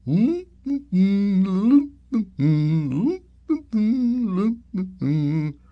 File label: prize idle llama; Ringling Bros. and Barnum & Bailey; Wii